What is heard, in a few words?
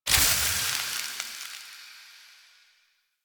Rifle Reload Steam; Weapons; Warframe; Thanotech